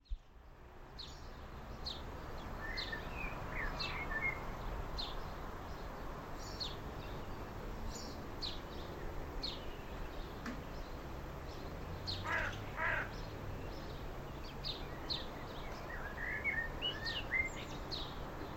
country garden, Somerset

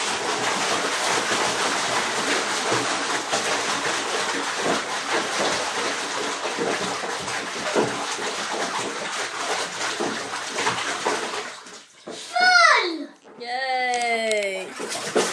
experiments in splashing

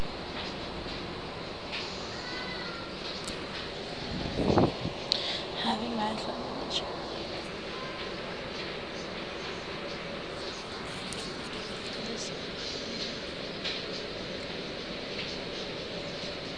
NYC L train

